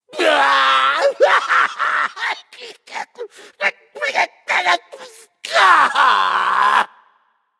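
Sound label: non-verbal boohoo; smite; voicepack; jingwei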